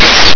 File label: screech; Emperor - Battle for Dune